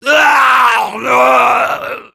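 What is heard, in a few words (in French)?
pain critical death, fr; Hurlement d'agonie 3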